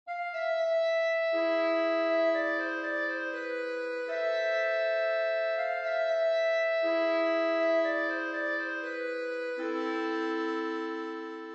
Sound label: music sample